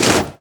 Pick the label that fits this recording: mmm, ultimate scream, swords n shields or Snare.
swords n shields